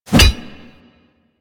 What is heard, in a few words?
Wolf Axe Catch C; Warframe; Grineer